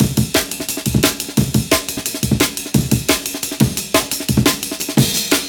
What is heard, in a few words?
Amen Clean 175, classic dnb breaks